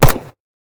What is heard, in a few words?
mp5 fire; midnight guns